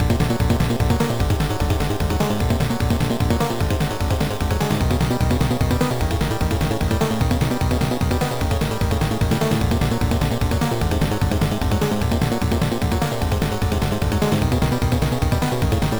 Middle Boss BGM; super star soldier j; turbografx 16

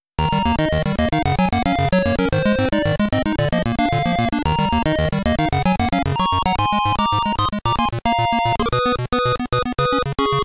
POWER UP (PC-8001); New City Hero

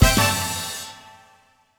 Disco Stinger Stab

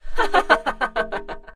Victory

